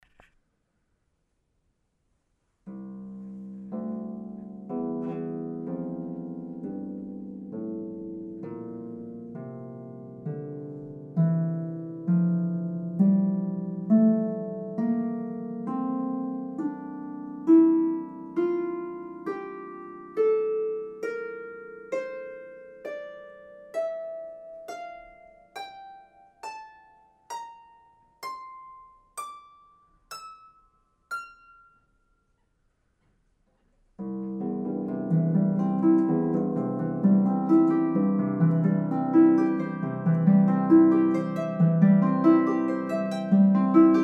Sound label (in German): Klangbeispiel Elu Harfe; harfenforum